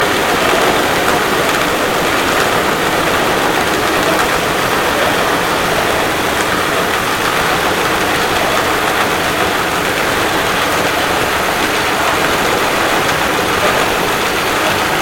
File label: Hydrophones, orcasound